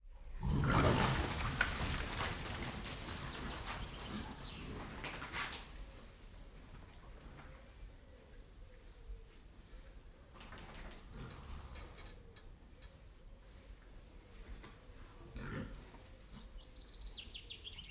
animals pigs in wet mud feeding drinking slow activity internal shed